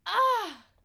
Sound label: Screaming Woman Joy